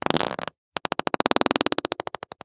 fart short initial burst long release

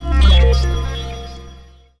Win; MGStar; Dark Dimensions: City of Fog

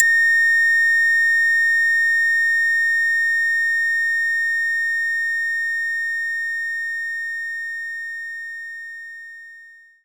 Marimba C3

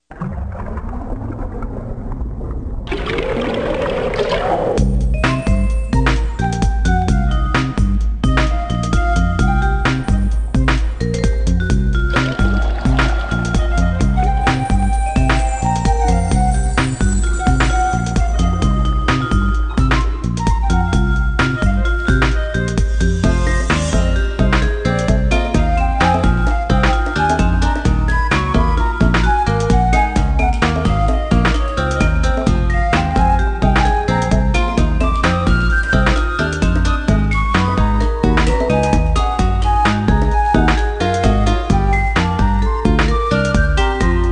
Underwater; Cosmos Chaos; NDS

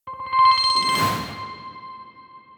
tracks unlock notif counter

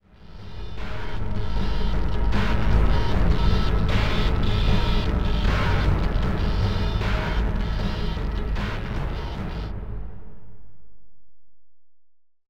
Ambiant

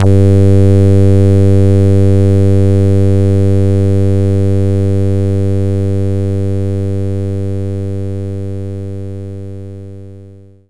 ow bass; knights try

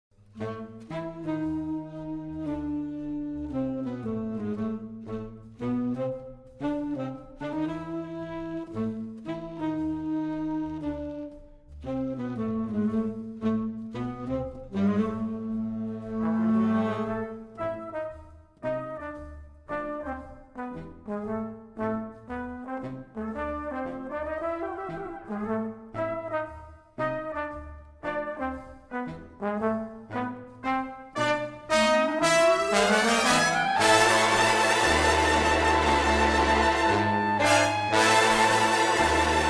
Slinky Horns Solo; the movies 2005; Inspector

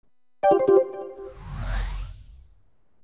Logo Animation; Wonder Boy Asha in Monster World PS4 Switch Windows gamerip 2021; ININ Logo Animation V5

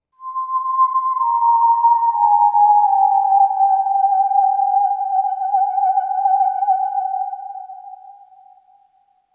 Oneshot Synth

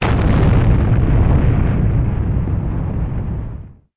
Explosion; CastleMiner Z; Xbox 360

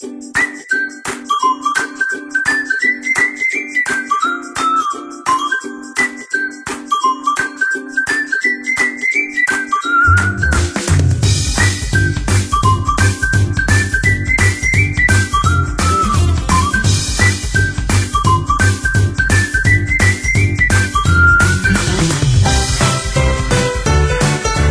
Positive loop; Drago Noka; PS4; PerituneMaterial Positive5

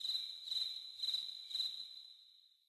Ambience Crickets; Level17

